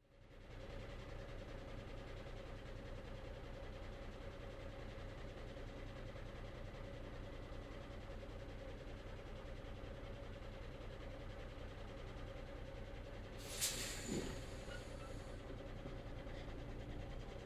priblizhenie mashiny

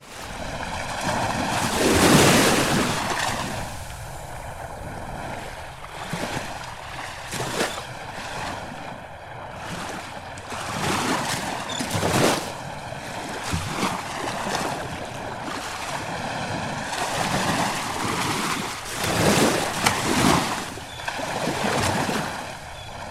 Шум моря

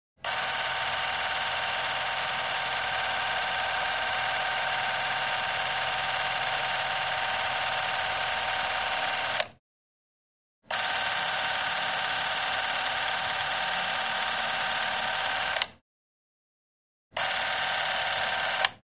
zvuk starogo kinoproektora

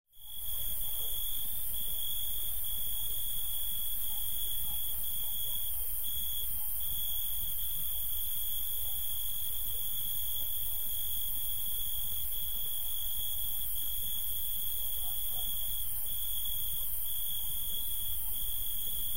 nochnye cikady i sverchki

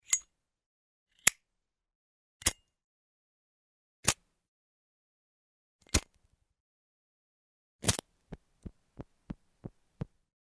zazhigalka zippo

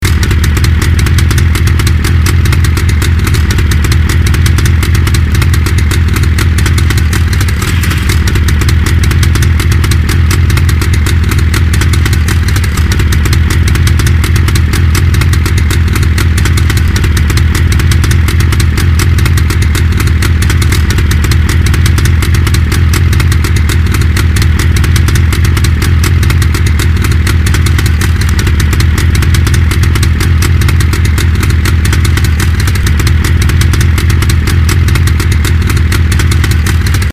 Zvuk motocikla - JAWA

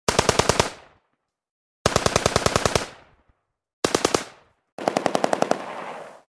ochered iz ak 47

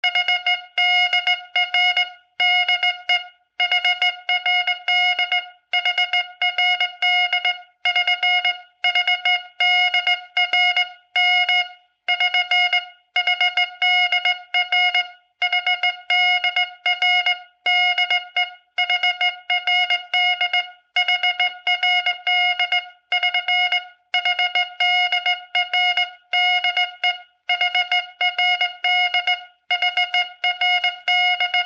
zvuki morzjanki

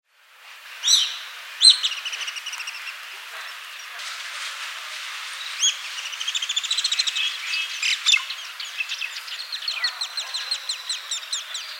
golos belobrjuhogo strizha

